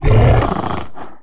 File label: sight, strogg; Quake 4 Alpha; id software; tik